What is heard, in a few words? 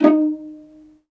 violin F; ILIO; Synclavier Strings